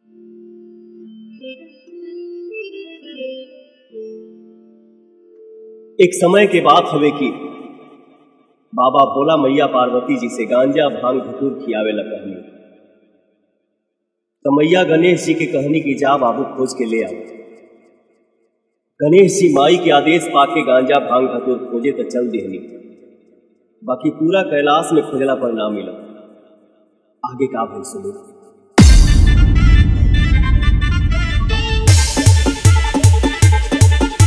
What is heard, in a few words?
Bolbam Full Dhollki Bass Rod Show Dance